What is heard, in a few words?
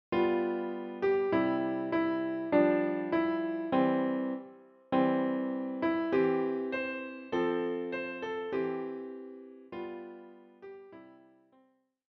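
piano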